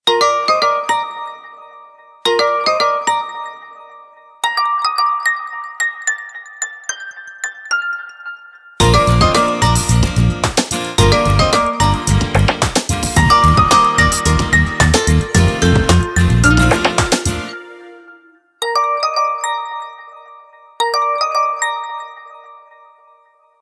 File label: journey, ringtones; OnePlus 11